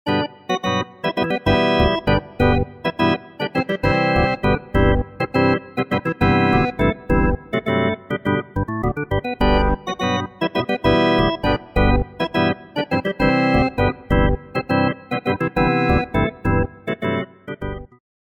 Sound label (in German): Elektro Orgel; Harmodion; Syntheway